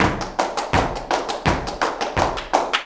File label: JALEO TAP, Flamenco